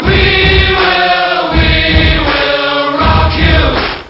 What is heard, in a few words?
ready; Quake 2